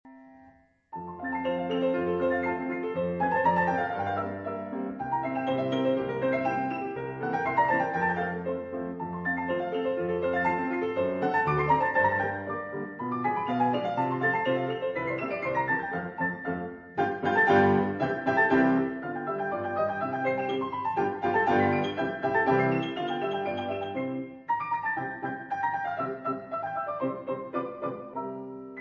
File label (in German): Kramer glockenspiel